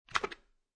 CD Removed From Jewel Case

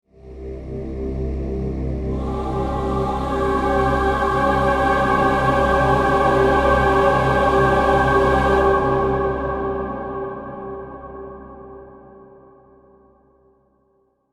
Horror Swell Choir Vox; Production Element; Swells (Sound Effects:Sound Design)

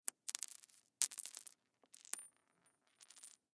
Coin Drop Separate Cement Multiple; Money Sound Effects; Objects; Foley

